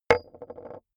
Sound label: metal impact wood light rolling